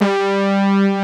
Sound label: JP8 BRS, JUPITER 8; Greytsounds VOL-1